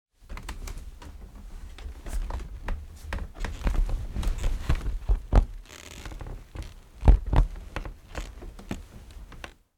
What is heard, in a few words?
household bunk bed wooden person climb up onto top bunk recorded underneath